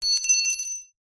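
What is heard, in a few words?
industrial aluminium key drop concrete floor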